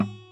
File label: excite picked nodamp